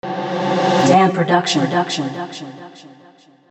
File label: Jingle